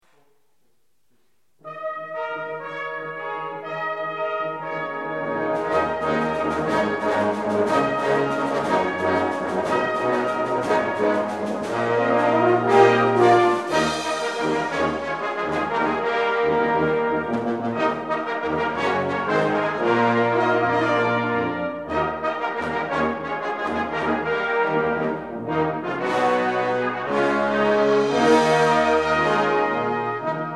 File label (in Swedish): fanfar till jesus